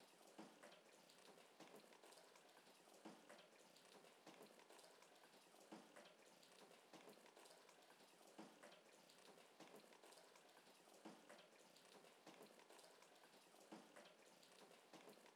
90 BPM F# Min Texture; Loop Stems; Cymatics; Lucid

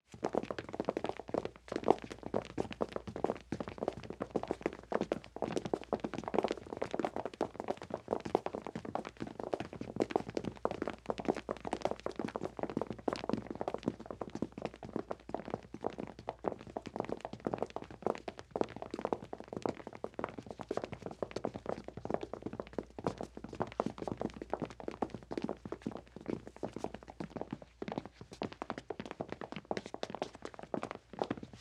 BG Footsteps Concrete City; Fairlight Sound Lib

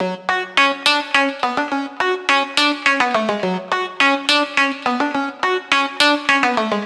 Riddim Melodic Loop - F 140; Vision; Ghosthack; Riddim Essentials Volume 2

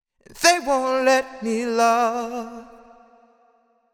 Sung Phrase Wet Let Me Love 150 BPM G# Min; Cymatics; Euphoria Vocal Sample Pack

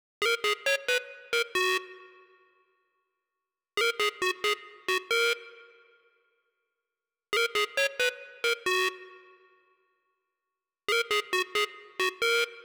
135 BPM D# Min Lead; Melody; Loop Stems; Cymatics; 2022 Melody USB Expansion; Serotonin

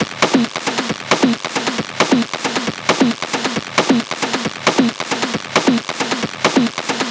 Perc Loop 135, Techno

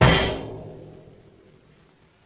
Bass Drum Cymbal Hit; Vintage Cartoon; Drums & Percussion

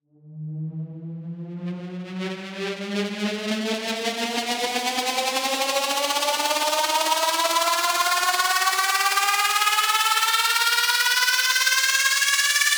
Titan Riser - 150 BPM D; Cymatics; Risers

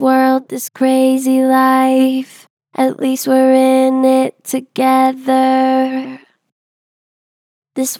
Gentle Flat, World this crazy life, Vocals; Harmor Vocals

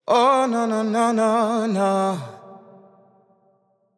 Euphoria Ad Lib Wet - 95 BPM F Maj; Cymatics; Vocal Sample Pack; Ad Libs

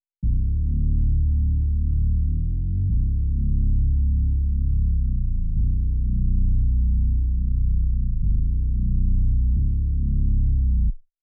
90 BPM F# Min Bass; Loop Stems; Cymatics; Lucid

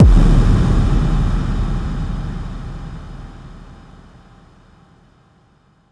Impact Implode; One-Shots; SFX; Ghosthack; Color Bass Essentials

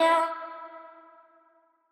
Wet One Shot - E, Vocal; Tonal One Shots; Cymatics; Euphoria Vocal Sample Pack

